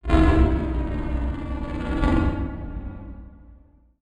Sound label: Transition Blast Distortion; Production Element Title Transition